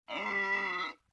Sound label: LoFi Synthetic Bird Caw; Vintage Cartoon; Comedy Vocals